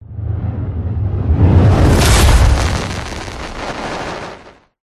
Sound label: whoosh; Audio Mangler